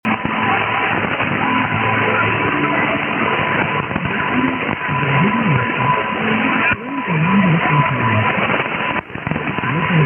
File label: unid 530, mw dxing